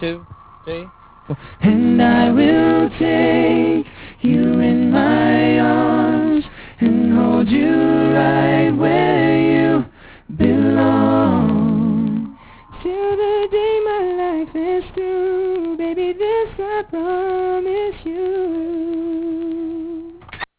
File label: A Cappella